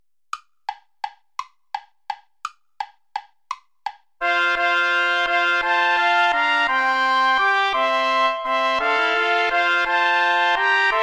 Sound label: play along without trumpet; praetorius michael dulci jubilo